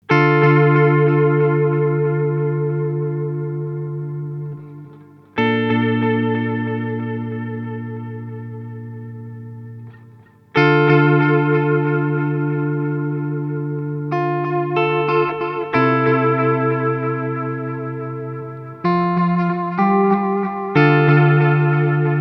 Earthquaker Devices Disaster Transport time 12 Uhr mod speed fast mode stretch

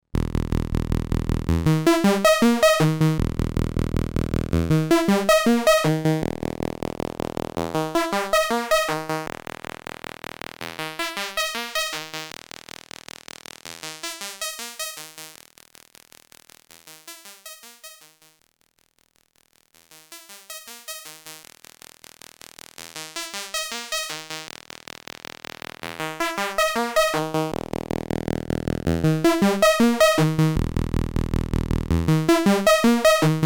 Klangbeispiel Lamda

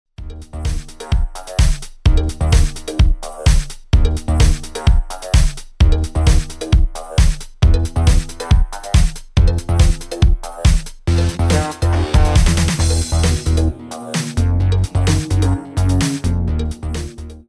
Pitch ohne

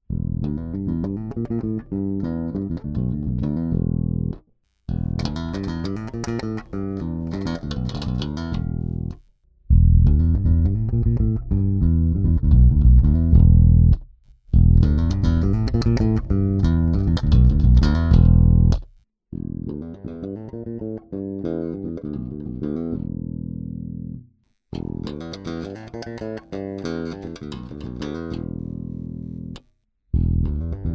Spector Fingerstyle Alle PU und EQ Kombis

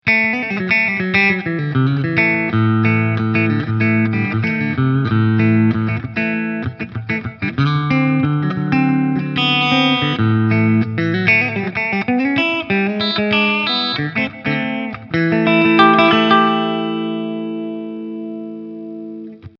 clean Mitte bluesy

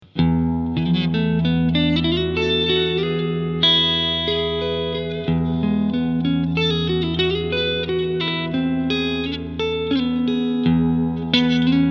Cleansound, EQ 12 Uhr, Full Reverb; Behringer HA-40R

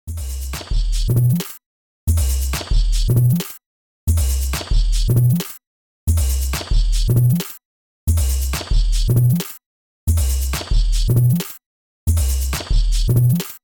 Slice normal Quant 4tel sync none

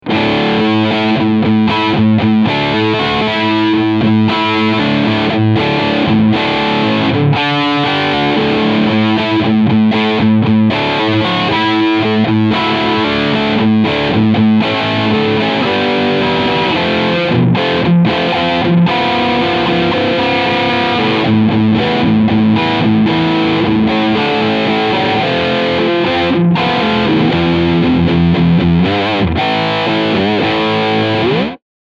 PRS SE Starla Riffsound Steg Pickup

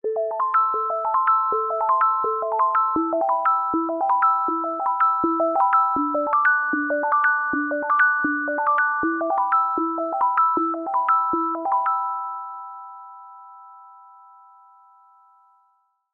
Glöckchen

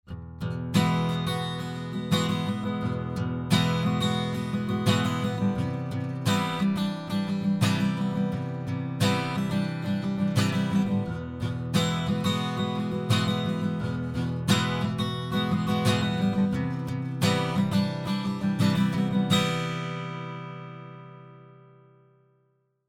WA 47 Git Acht

